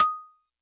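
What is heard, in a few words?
Xylo Snare DX100 D sharp 1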